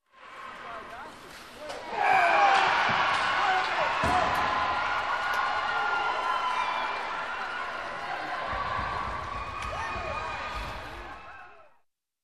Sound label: Crowd Surprise Celebration